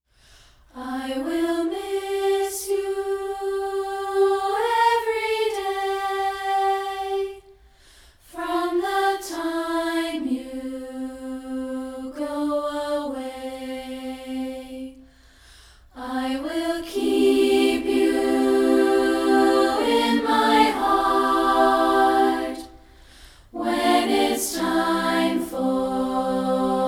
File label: I Will Miss You A Cappella